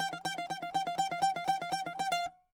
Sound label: bouzouki